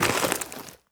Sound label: step light, footsteps player debris